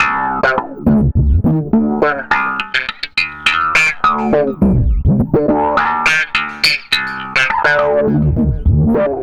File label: Processed Slap Bass, Electric Bass